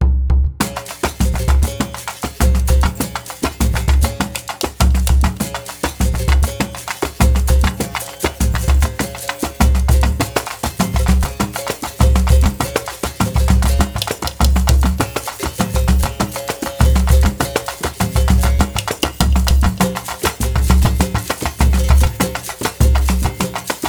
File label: surdo samba duro